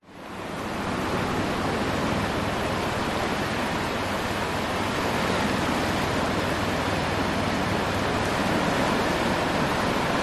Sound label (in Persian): sedaye darya